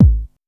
old school strong bottom end bass drum one shot A key